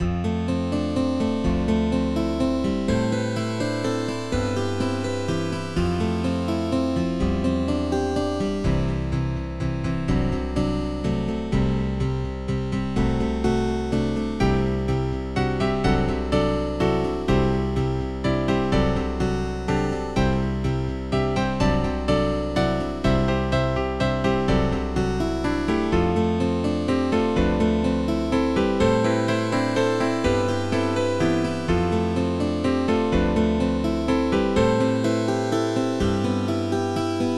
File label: My New Guitar